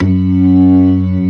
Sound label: B3 LO DIST; E-MU Formula 4000 Series Vol. 3 – Analog Odyssey; Vintage Keys